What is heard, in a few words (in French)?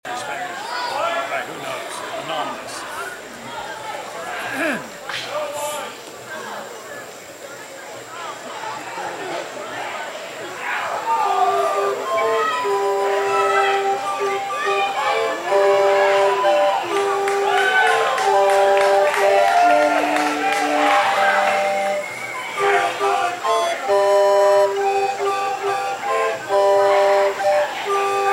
flutes a bec